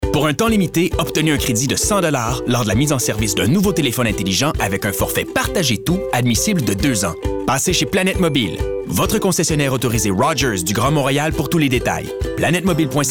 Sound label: music; fr